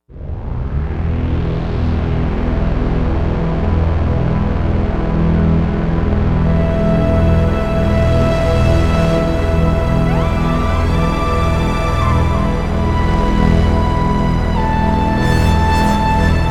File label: Dream Drone